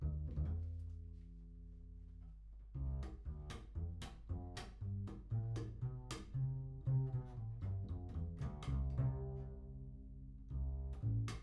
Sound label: Voc Omni